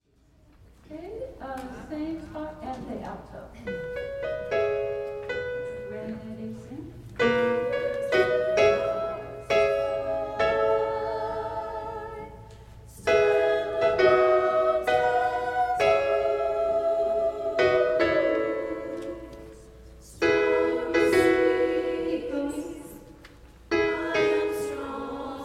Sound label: alto